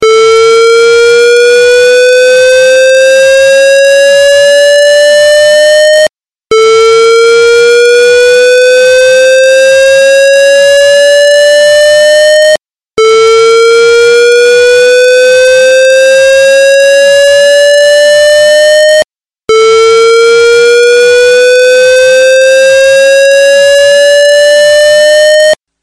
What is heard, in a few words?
Power Alarm